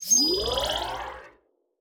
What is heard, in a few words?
pickup health; Learn to Code From Zero With Godot